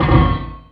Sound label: metal; Optical Media International; Sonic Images Library; SI FX Vol 13; Instrums